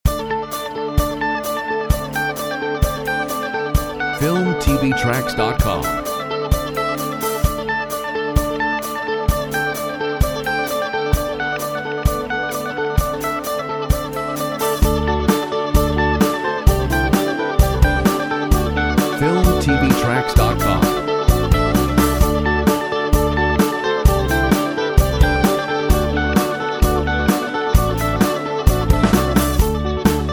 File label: Rock Stellar